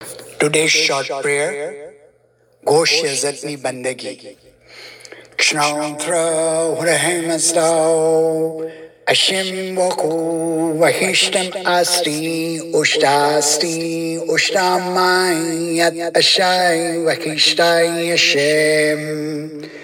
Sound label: Prayer chanted; Gosh Yazad; daily short prayers